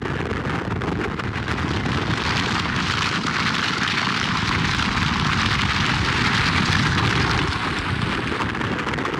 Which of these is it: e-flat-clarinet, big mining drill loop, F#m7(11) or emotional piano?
big mining drill loop